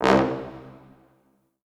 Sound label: Orchestral Brass